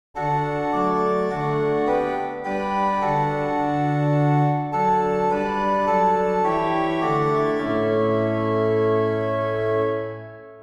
Organ; Doncaster; 4 Verses

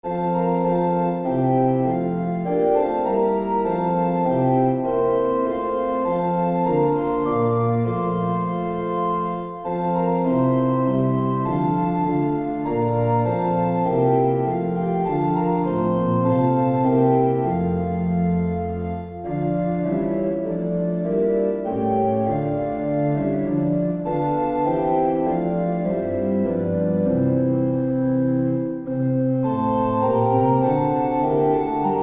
Organ with Trumpet Descant

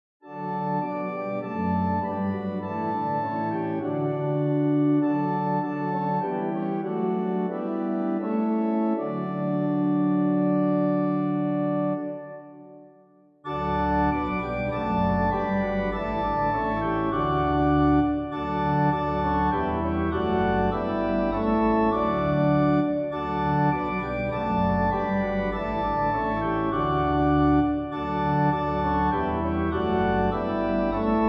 Organ and Trumpet

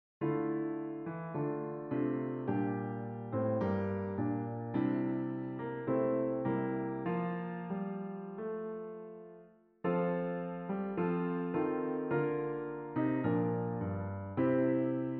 Piano

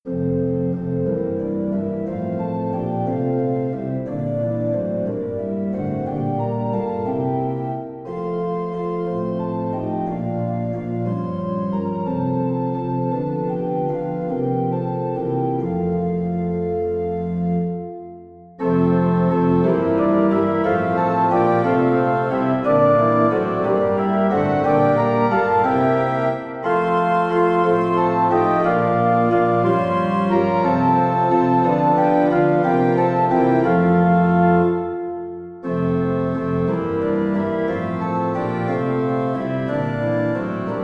Organ

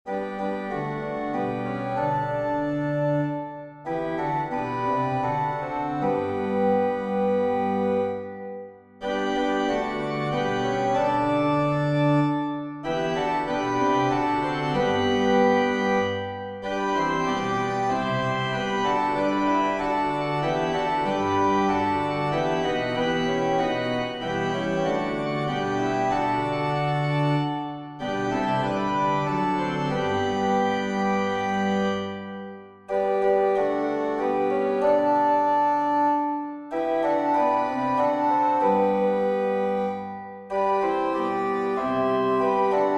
Organ

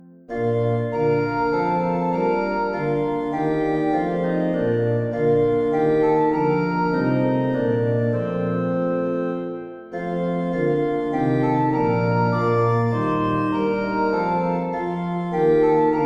Organ

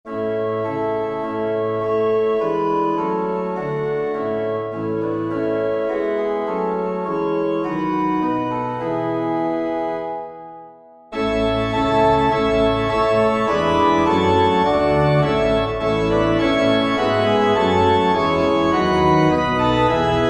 Organ

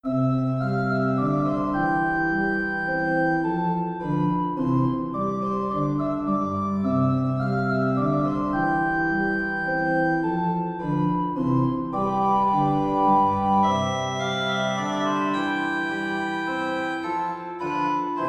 Organ Miniature Minuet in C Major

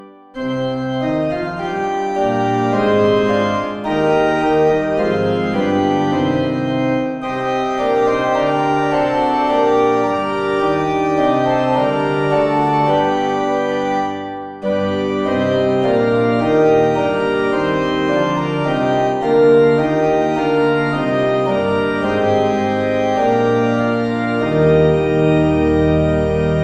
Organ

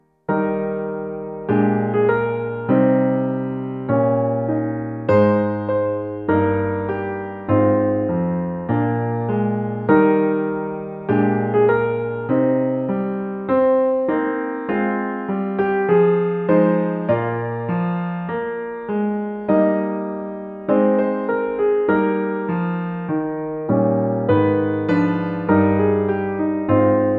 Piano

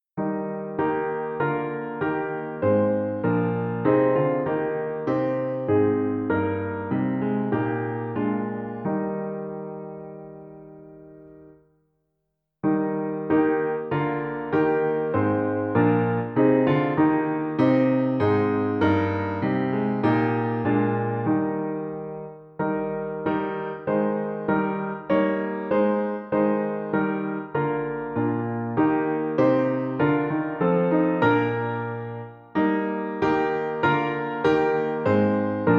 Piano

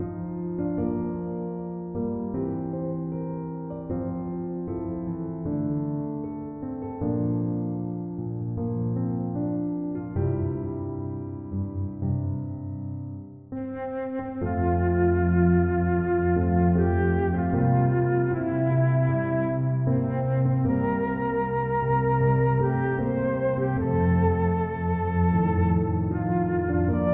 Piano Ensemble

